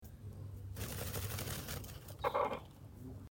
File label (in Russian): petuh strusil peria